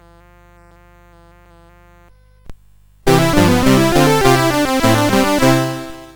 Noisez, CSG8580R5

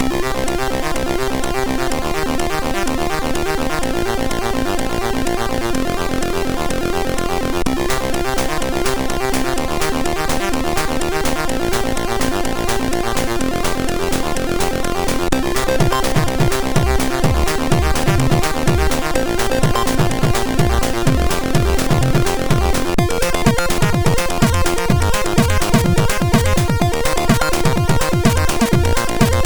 Hyperdrive MOS6581R2; Mermaid

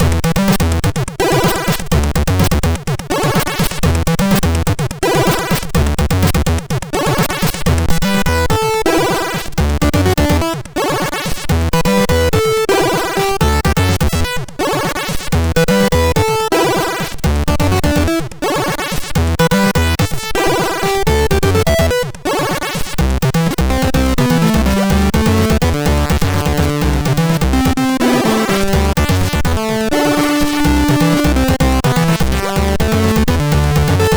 Melody, MOS6581R2; Melody of Unknown Origin

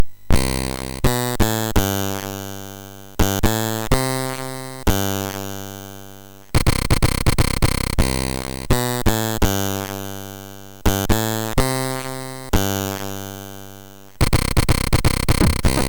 Beatsync sid MOS6581R3; hvsc

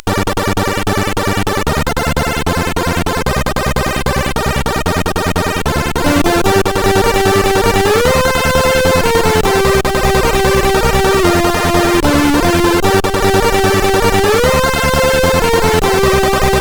Chip Arp, CSG8580R5

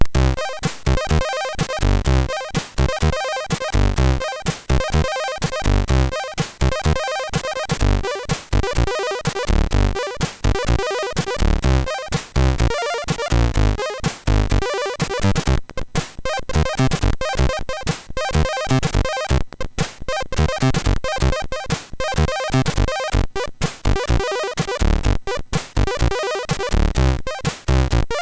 One Channel sid MOS6581R3; Sidder

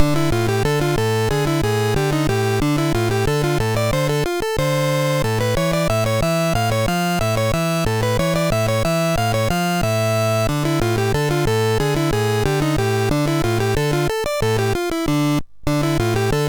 Dragons Den, MOS6581R3

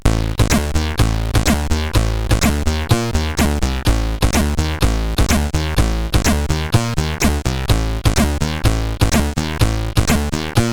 sid, CSG8580R5; Snowmen

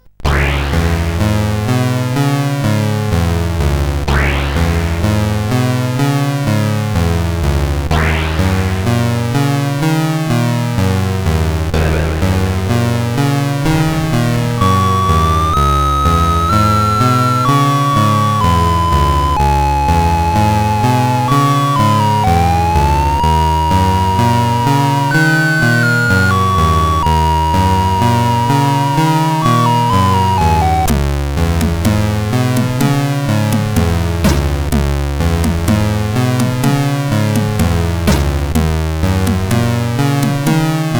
Game Over, sid CSG8580R5

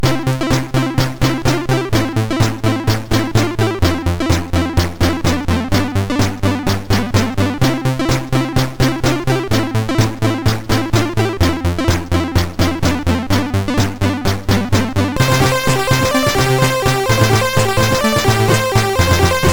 Music Samples, sid, MOS6581R2

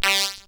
Shuffle, CSG8580R5

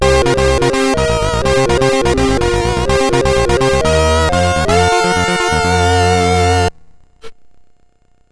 Ice Age, CSG8580R5

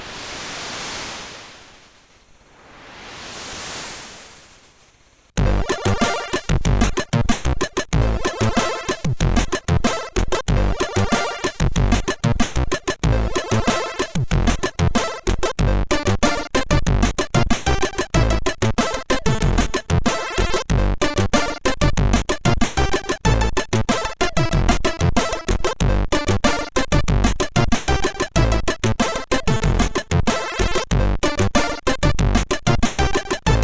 Tropical, MOS6581R2

